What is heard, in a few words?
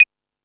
Beat Box, E-MU Pro-Cussion; drum machine